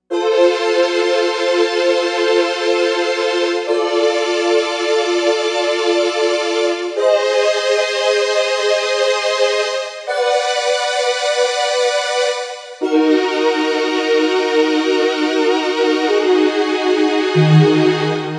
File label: Digital Stringz, Novation UltraNova; Factory Patches